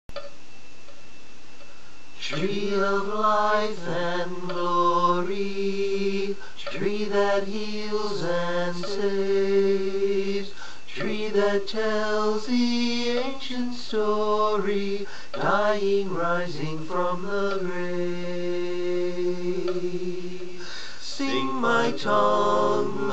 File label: music; veneration of the cross